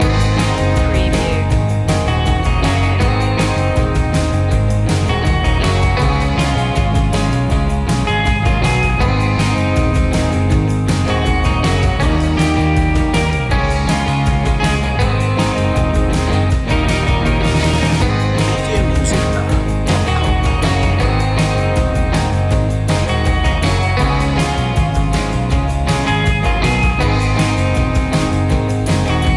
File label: surf loop